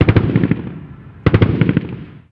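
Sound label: gun us assault medium; ModernWarfare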